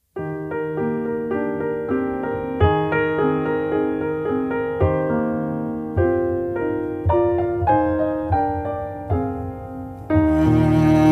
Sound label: Piano & Cello